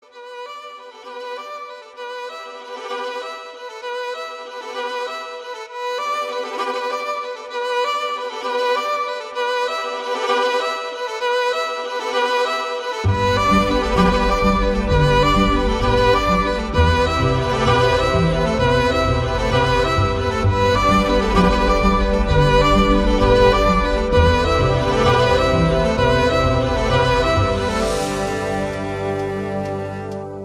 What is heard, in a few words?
Violine BGM Ringtone; Kothabangarulokam